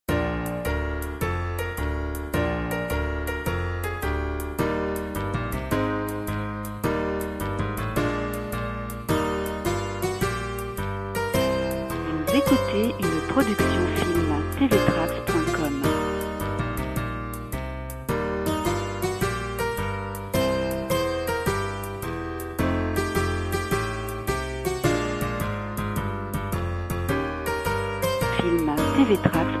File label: Rag Cowboy Blues